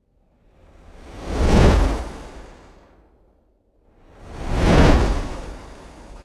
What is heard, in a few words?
two thick airy swoosh pass bys